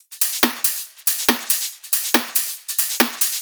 drum Loop 140BPM no kick; Vengeance - Essential Clubsounds Vol.5; VEC5 Drumloops; VEC5 Multi Loops 140BPM

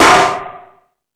Hip Hop, Drum Machines E-MU Pro-Cussion

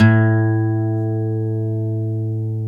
GTR 6 STR A3, Steel String; Roland LCDP02 Guitar and Bass

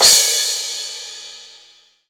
CRASH

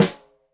Snare Stereo, Drums; Deeply Sampled Drums; SMDrum

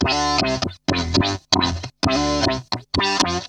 Funk Guitar; 90 s Sample CDs; Zero G

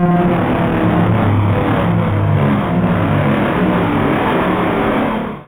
ALIEN LANDS; ENVIRN-AMB

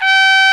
TR C5, trumpets; East West - Global Sounds

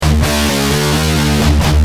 G Heavy, Guitar; Industrial

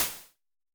Snare Hats, Vermona Mono15 Drums

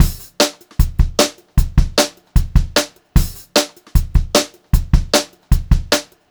Brushed Drum Loops; USB Soundscan vol.39; AKAI

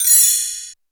Dry Set; Roland U220

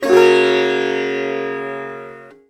SITAR CHORD; SITAR CHORDS

